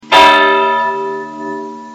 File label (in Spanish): campana santa catalina